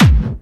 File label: BD Clubby, kicks; VEC1 Clubby